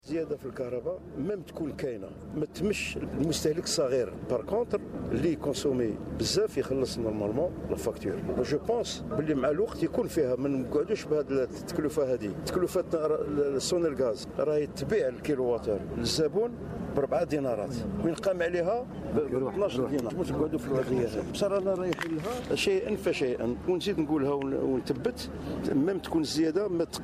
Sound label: guit